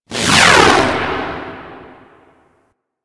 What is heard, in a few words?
Space Ship Missile Proj By; Warframe; Grineer Pursuit Space Ship